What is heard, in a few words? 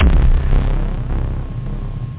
back bass drum machine; alienbreed3d2